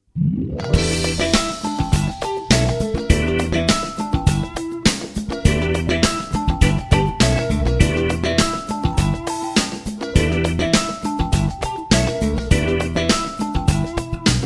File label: Funky catwalk, drums play-along; Funk '70s